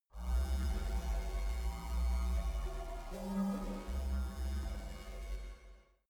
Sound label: space inside biomedical alien shuttle buzzing energy low